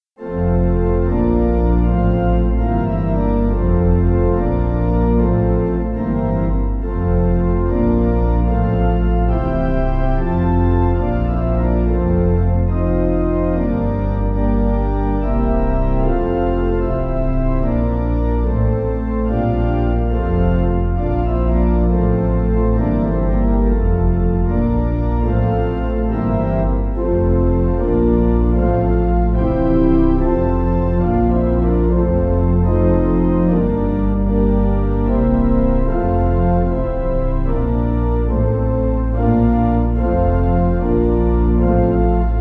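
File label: Pipe organ; Agnus Dei; Wooding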